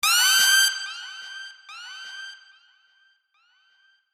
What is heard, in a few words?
siren; kill bill